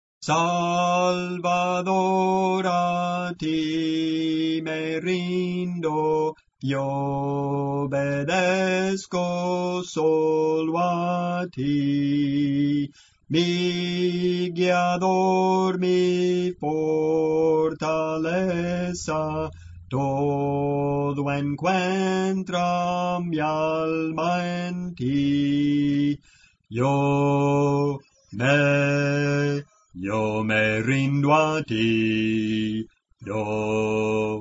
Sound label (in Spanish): Bajo, Cantos Espirituales